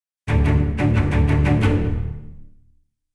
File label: Combat Out; The Witcher 3 Wild Hunt; Novigrad